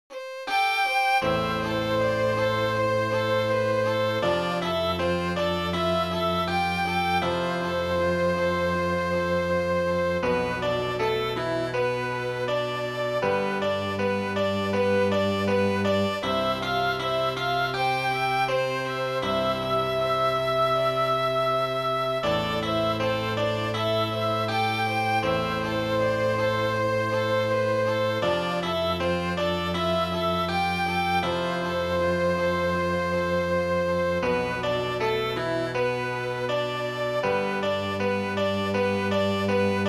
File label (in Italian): Base classica